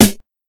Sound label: 00s crunchy snare drum g sharp key